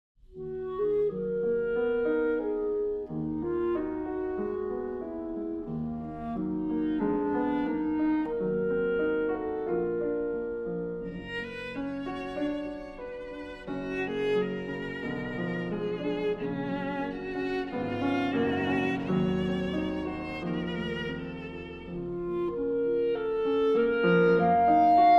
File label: Clarinet, Viola & Piano - Moderato